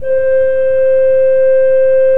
KOPPELFLUTE C4, Cathedral Organ; Propeller Island

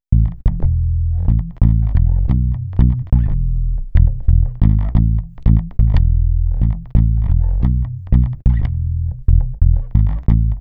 Dub Bass, Electric Bass

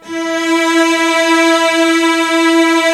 E3 mid, lush strings; InVision Interactive; Lightware VOL-1; Instruments & Percussions

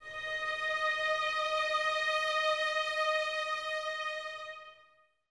violins D#5, String Ensembles, 23 Violins; Miroslav Vitous

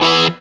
GTR Crunch, Dirty; Club-50 - Foundations Roland

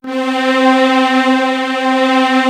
DEEP VX, 01W CHURCH; East West; Global Sounds

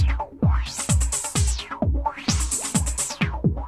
WHAWHA; AMG; Global Trance Mission VOL-2; PROCESEDLPS

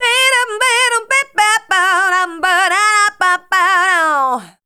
RHY LINE, Voice; Best Service; Voice Spectral II

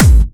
Clubby Kicks, Bassdrums

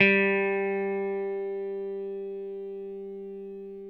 6STR BASS, G; Sampleheads; Whole Lotta Country 1 & 2

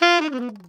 alto short fall F 3, sax; Greytsounds - Philip Wolfe David Crozier's Saxophones; SHORT FALLS

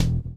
blow; Spectrasonics; Metamorphosis

